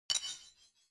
abstract percussive drumstick hit clank soft

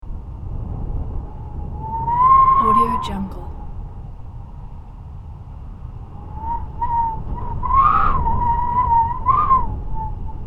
wind whistle howl

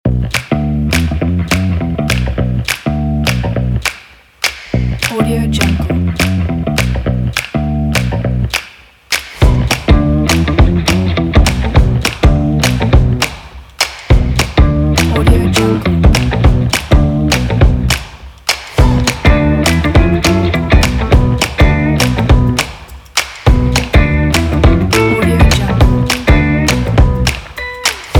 funk it

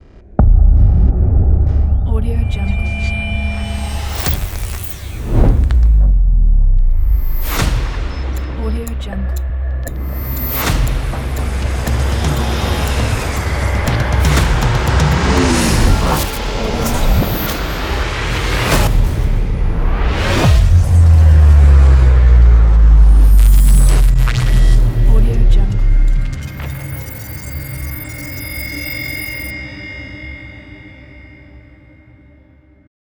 trailer intro ident

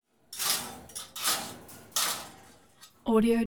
banging on metal fencing

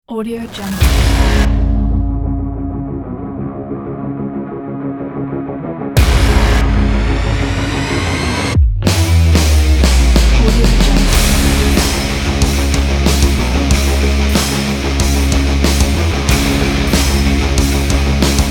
modern pumped rock

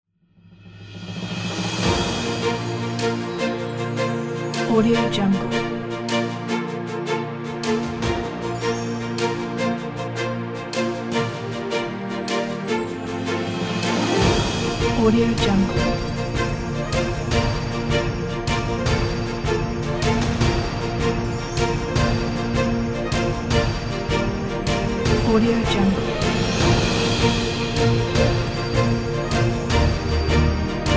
awarding inspiring; colorfilmmusic

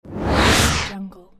slide

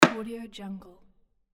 wood mallet hit; monochromeeffect

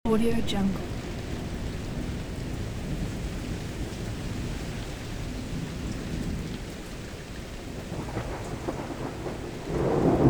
thunderstorm; voxei

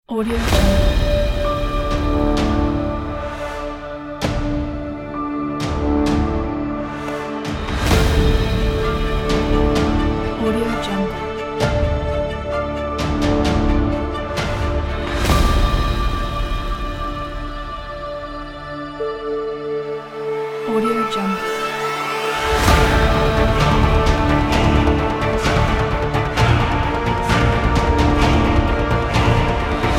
epic hybrid trailer

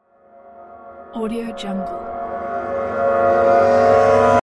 reverse whoosh horror

